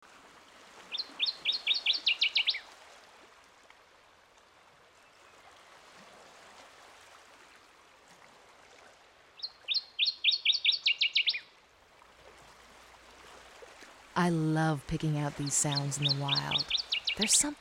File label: Northern Waterthrush